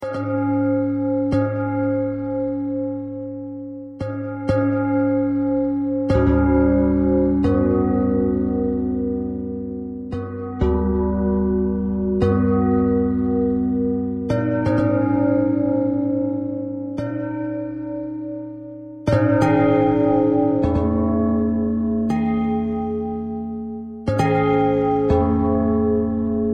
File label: bell bell thread noise